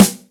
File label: Snare H, Yamaha DTXpress Acoustic Snare